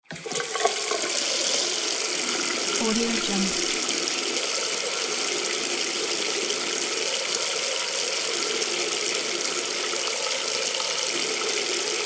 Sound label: bathroom sink